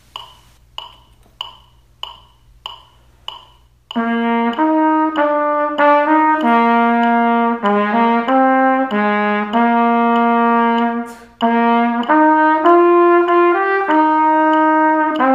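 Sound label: trumpet only, quarter note=96; Play-a-long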